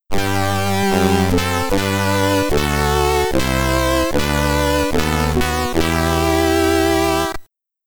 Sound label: West Germany Deutschlandlied